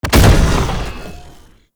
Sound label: Tank Ram Ability Hit; Warframe; Lasrian Tank; Abilities